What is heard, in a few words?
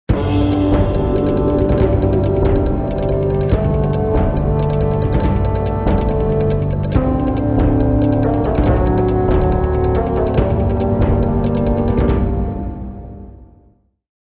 Ancient Combat; Civilization Revolution; Xbox